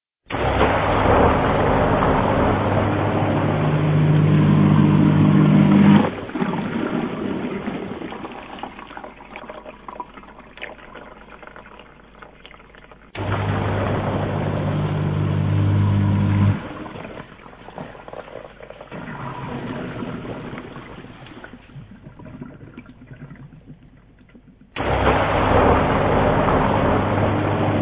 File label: silence